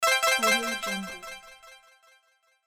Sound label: synth achievement